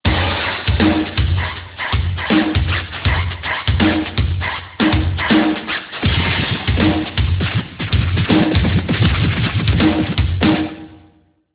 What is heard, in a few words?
ringtone scratches